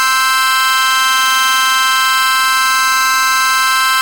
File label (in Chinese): Tassman C5、G5、E5的锯齿波和声